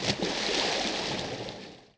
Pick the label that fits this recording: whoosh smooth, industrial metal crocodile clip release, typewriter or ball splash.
ball splash